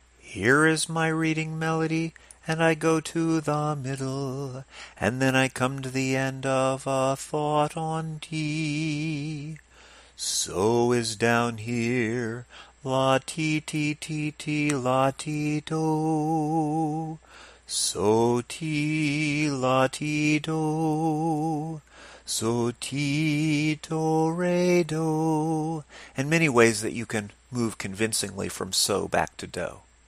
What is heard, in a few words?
Reading melody conclusions